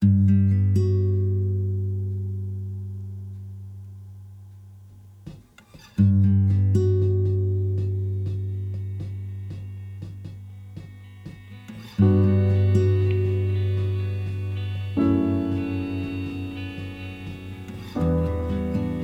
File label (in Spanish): Piano Electrico